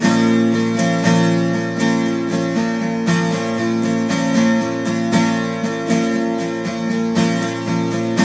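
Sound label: 12 string minor B, guitars; Spectrasonics; Hans Zimmer Guitars VOL-1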